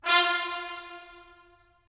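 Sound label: brass trumpets staccato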